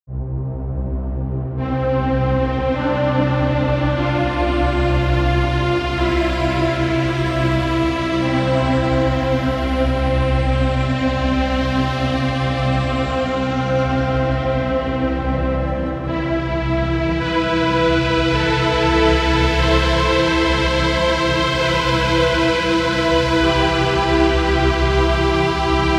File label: Synthesizer (before Little EQ)